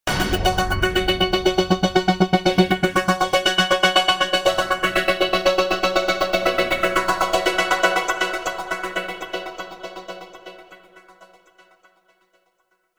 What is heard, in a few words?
Tempo Sync Pulse, Synths; PC3